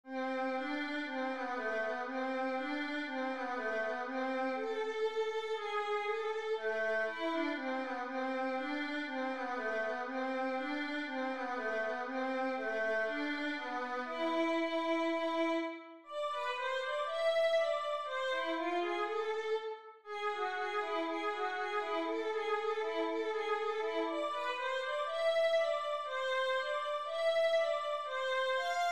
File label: etude, violin